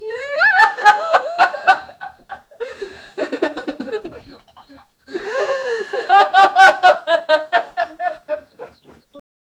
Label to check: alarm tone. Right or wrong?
Wrong